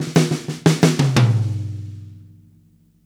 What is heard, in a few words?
Fills, Monster Beats; Zero-G